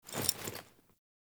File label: Female Foley Action Default Dodge, Default Clothing; Characters; Player